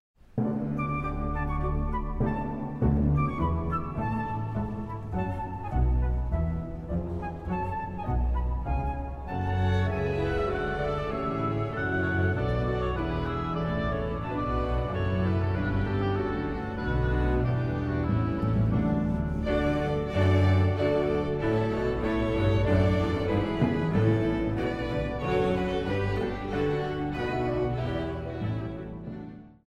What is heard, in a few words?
gavotte; Een vaste Burcht